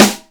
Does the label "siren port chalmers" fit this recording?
No